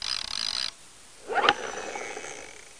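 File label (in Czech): obchod, shop; pavouk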